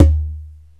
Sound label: CONGA SET; OMI - Universe of Sounds